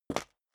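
step high heels concrete grit single right, footsteps; schritte; menschen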